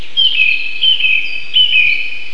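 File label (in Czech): drozd, thrush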